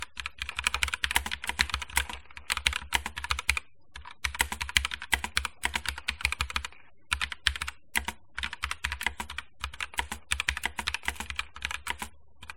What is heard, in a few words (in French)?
enregistrement son asus rog strix scope nx tkl; moonlight white